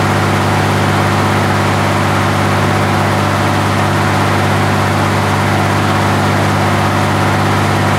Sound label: pt76 engine rpm 66, tanks; War Thunder